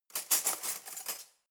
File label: cutlery knives drawer grab